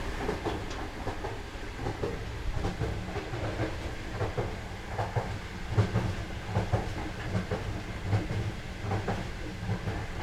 Train Siemens Desiro Ambience Interior Vestibule Door Open Constant Speed Wind; FLYSOUND